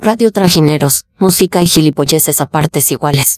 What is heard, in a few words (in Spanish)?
musica